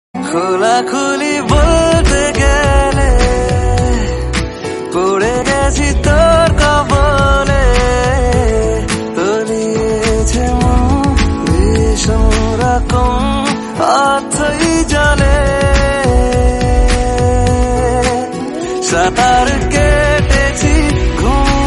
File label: bengali ringtone